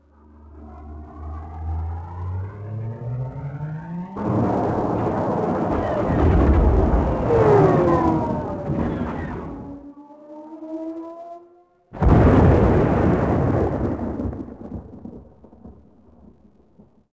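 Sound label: distant victory; Star Wars Obi-Wan; XBOX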